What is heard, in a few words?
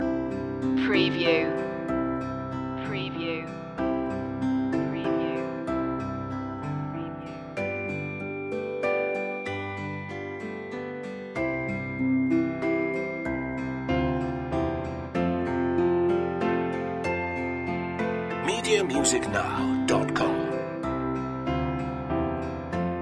orchestral long loop; silent night; media music now